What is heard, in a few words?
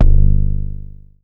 Jomox Kick; techno drum fx; Analogue DrumHits